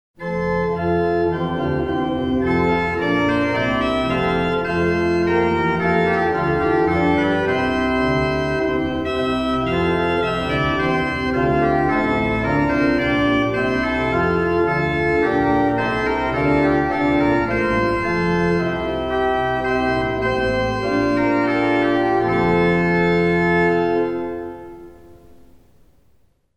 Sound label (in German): Lobt Gott Org kpl